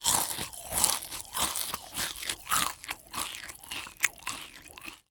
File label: HUMAN EAT